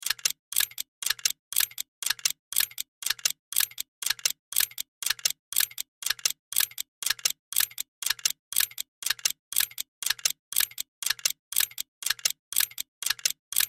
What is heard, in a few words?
Sci Fi Workshop Machines Mechanical Steampunk; Tech; Sci Fi Workshop Machines Mechanical Steampunk Wood Movement Inventor Loop Science Fiction